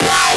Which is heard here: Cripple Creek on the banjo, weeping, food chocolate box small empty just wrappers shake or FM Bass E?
FM Bass E